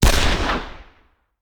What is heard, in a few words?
Hand Gun; Sound Ideas; Guns